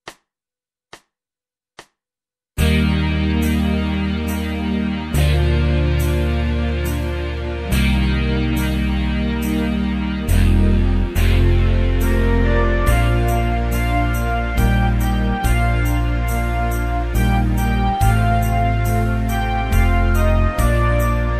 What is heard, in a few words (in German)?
Schulband Musizierklassen Lyrisch Serbisches Volkslied; Direktion Klavierbegleitung